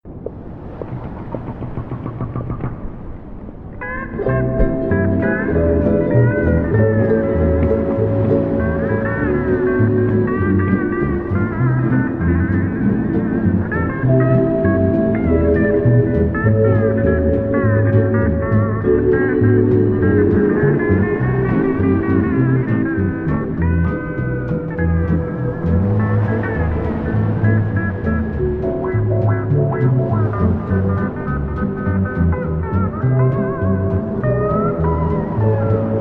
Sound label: chill spongebob music beach ambience